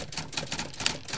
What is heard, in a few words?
type